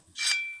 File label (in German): Kling; Gods of Akragas